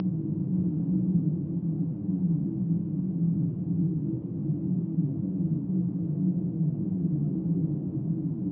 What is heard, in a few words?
windroom; lmctf